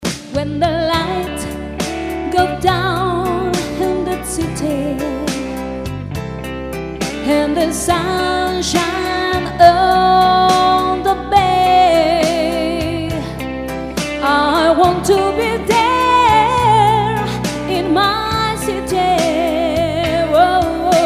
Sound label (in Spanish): BALADA ANGLO